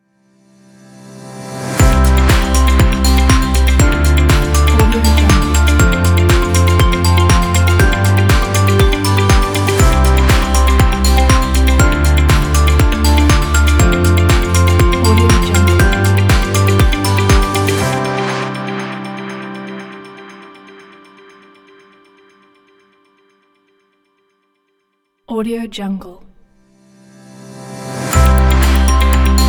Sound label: corporate motivational intro logo